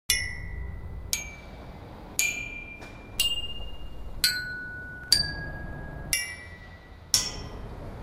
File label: c d e f g a b c